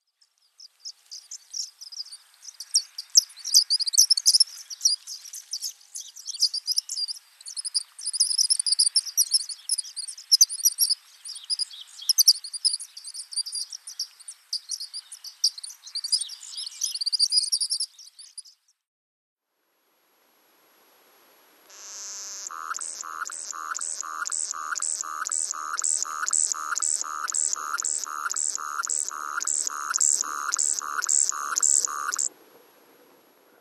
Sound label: White rumped Sandpiper